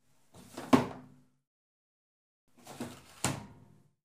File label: Washing machine, opening, closing the powder tray; laundry